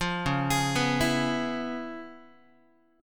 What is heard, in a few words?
C sharp 7th Sharp 9th Guitar, chord; arpeggiated; C#7#9; JGuitar